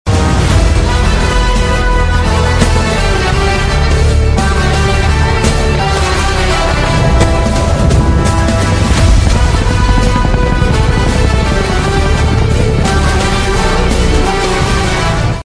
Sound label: fighter bgm ringtone